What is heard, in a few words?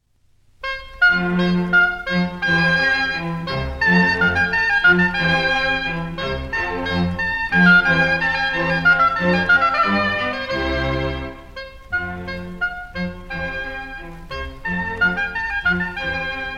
oboe; corelli; op5 n10 concerto oboe